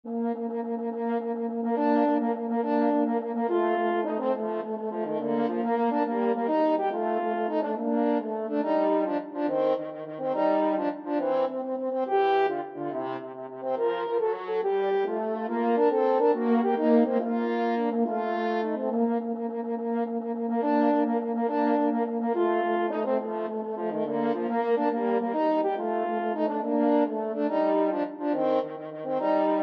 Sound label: French horn duet